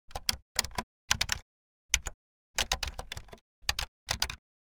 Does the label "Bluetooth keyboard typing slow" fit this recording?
Yes